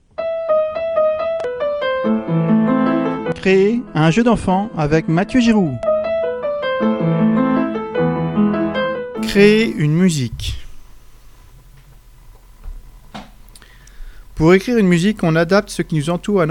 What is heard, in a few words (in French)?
musique